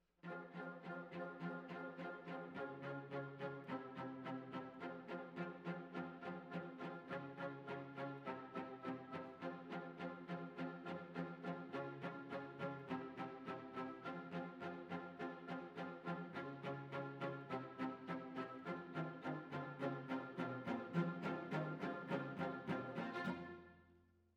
Cellos Over

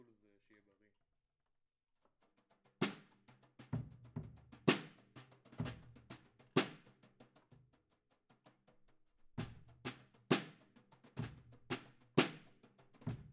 Kit, 96bpm

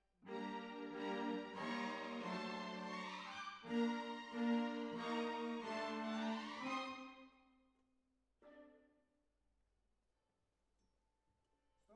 Cbasses

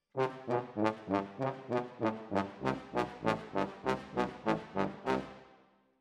Tromb ASS

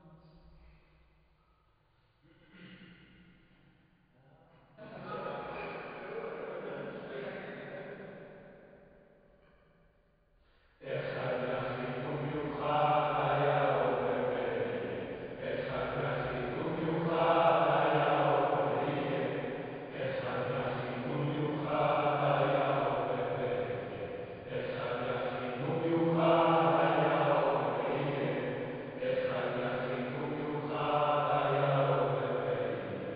Choir

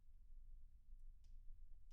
Children Choir; Studio Bekol Children Choir

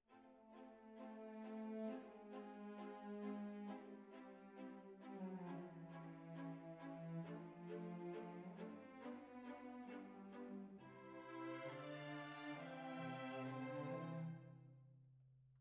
Oboe

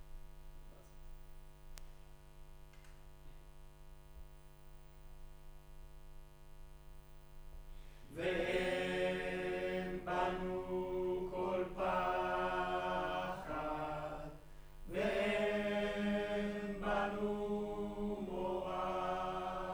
Room, Choir

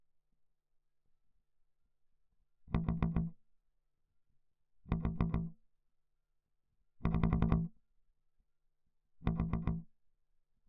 Bass Mute

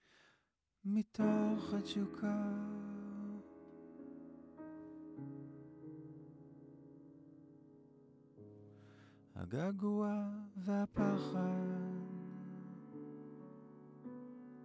Voc

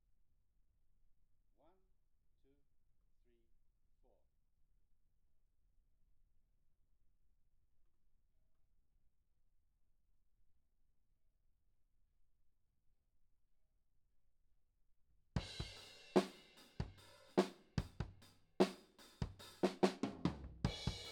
Kit

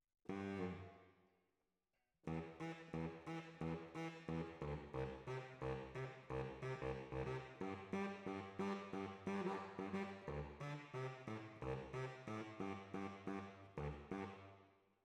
Alto, RNR for piano

